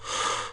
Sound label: Breath In; Characters; Ranger